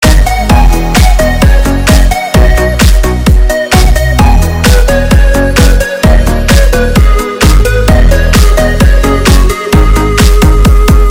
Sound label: dance china; fortnite emotes